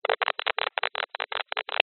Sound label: technology cb radio interference crackle